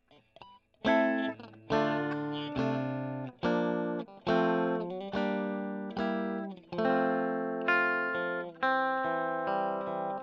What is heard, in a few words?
E. GTR Gefell